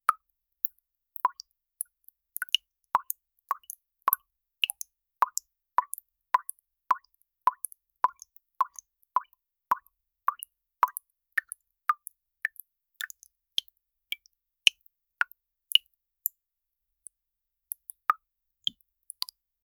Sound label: dripping water tap sound